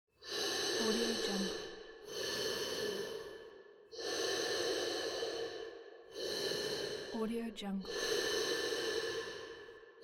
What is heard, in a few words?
deep breathing in the void